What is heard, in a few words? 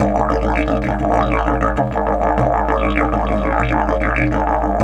DIDGE 100BPM